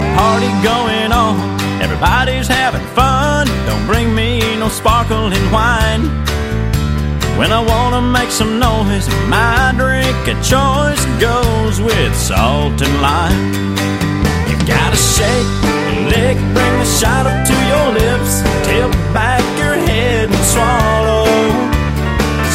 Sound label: song